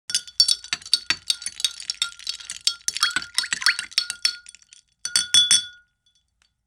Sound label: Stirring Beverage